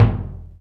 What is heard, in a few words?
low mid tom drum sound f sharp key; tom drum samples